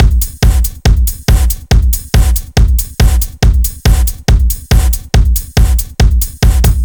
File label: Heavy B, trance